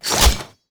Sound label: pickup armor large